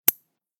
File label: nail clipper; everyday objects